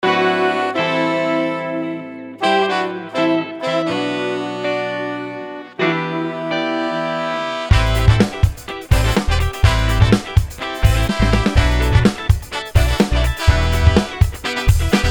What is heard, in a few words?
Funky Chops